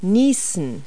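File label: sneeze; Multi-Lingual Talking Picture Dictionary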